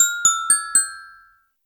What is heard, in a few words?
Hovering Nightingale